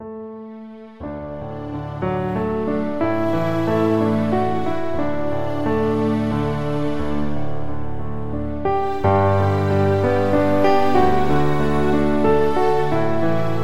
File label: Piano & New Strings; KORG OASYS; oasys assault